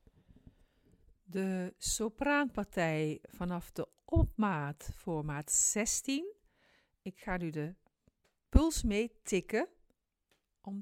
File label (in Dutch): sopraan; koorleden